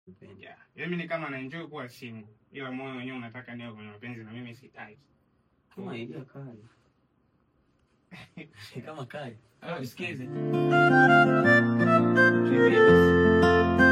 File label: Acoustic